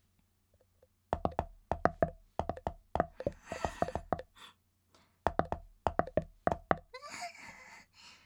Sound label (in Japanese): ああ